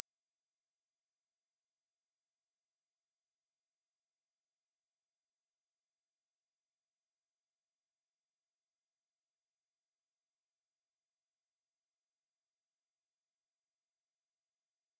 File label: 20khz